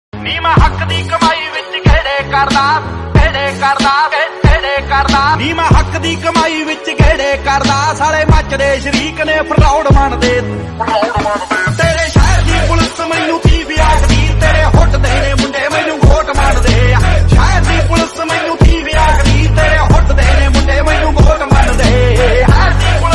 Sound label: punjabi